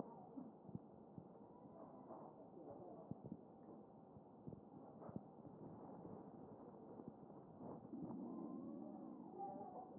chest

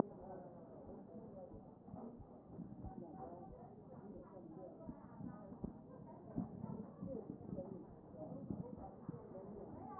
A CHEST

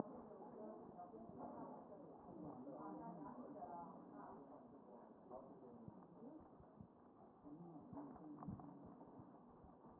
A CHEST

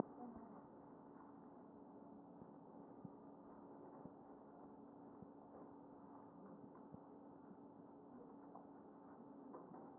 chest

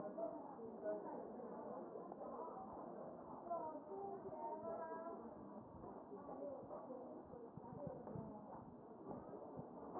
A CHEST

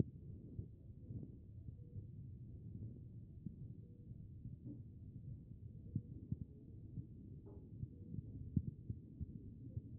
chest; B MODE

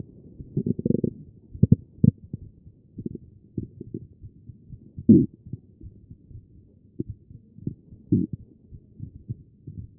A CHEST; B MODE

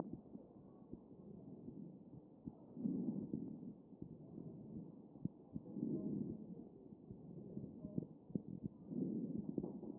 CHEST pos3 D MODE

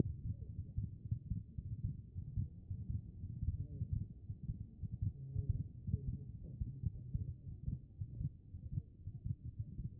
HEART; B MODE

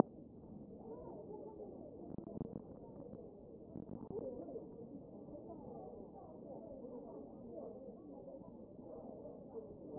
CHEST

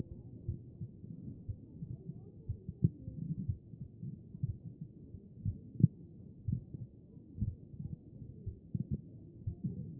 A CHEST; B MODE

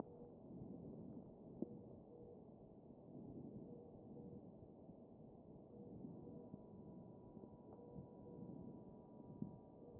chest

